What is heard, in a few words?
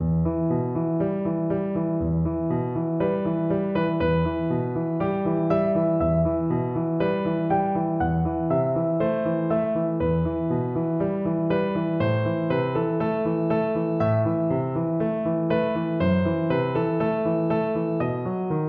mend violin piano